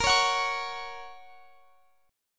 Bb Minor Sharp 5th

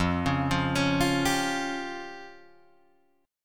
F Suspended 2nd Sharp 5th F down Guitar Drop D

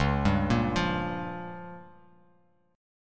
Db 6th Flat 5th Bass Standard, Db6b5 chord; Db6b5 arpeggiated; JGuitar

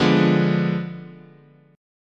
D Diminished 7th, chord

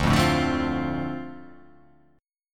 D-Minor 7th D, chord

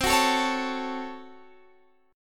A 6th C, Chord; A6/C strummed

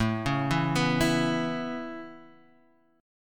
A Major Add 9th A Guitar Standard, chord; Aadd9 arpeggiated; JGuitar

